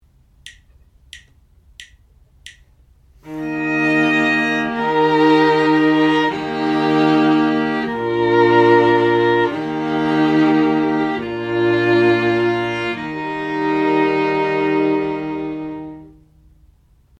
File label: harmonic dictation